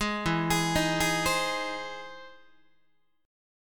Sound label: E Augmented Major 7th E down Guitar; arpeggiated; E+M7; JGuitar